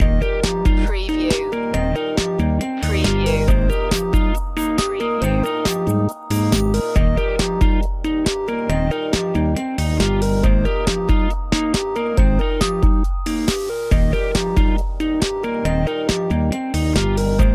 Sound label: electronic loop